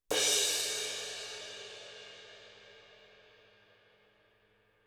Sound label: 18 CRASH CRASH, cymbals